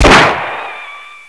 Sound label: pistol shot; wanted; weapons